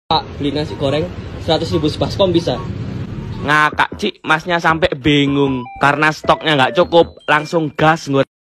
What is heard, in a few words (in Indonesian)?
nguakak